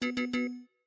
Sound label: wrong answer